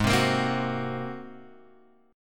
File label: G sharp 9th G sharp Guitar Open G